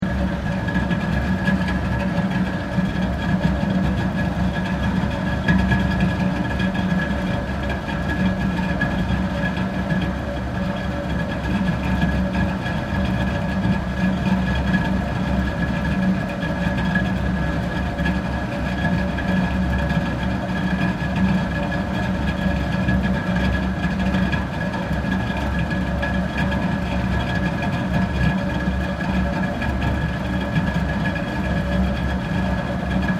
PUMPING STATION HOT SPRING FILTER CYLINDER, Japanese hot spring pumping system; Machinery; Sound Effects:Machines